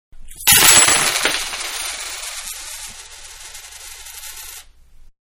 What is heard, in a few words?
Coin Several-Tossed Onto Counter; Money (Sound Effects:Objects); Manhattan Production Music